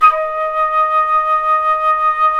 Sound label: FLT FL OCT, C Flute FX, C Flt OctAtk; Roland LCDP04 Orchestral Winds; 90 s Sample CDs